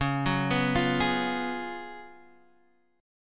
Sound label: Gb Major 7th Suspended 2nd Suspended 4th